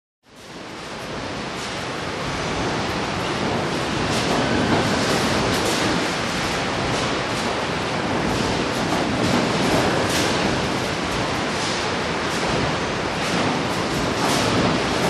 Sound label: Manufacturing Production Area ambience